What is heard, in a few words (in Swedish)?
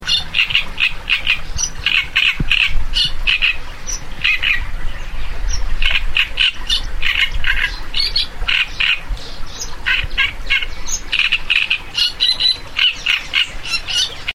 Trastsangare